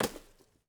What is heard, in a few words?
lumber tree